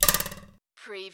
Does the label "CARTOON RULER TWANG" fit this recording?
Yes